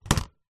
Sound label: technology computer keyboard bluetooth small down on desk